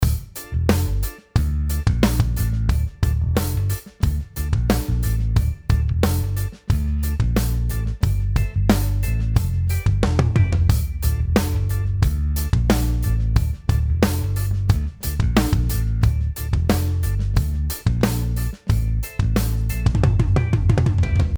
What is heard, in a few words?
Tom fills